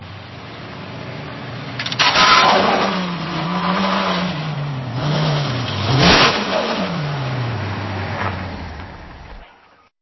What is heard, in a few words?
car breaking down